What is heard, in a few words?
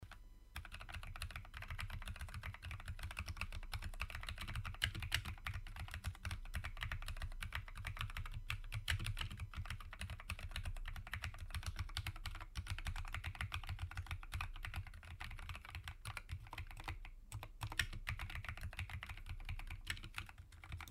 gmmk2; glorious gmmk 2 96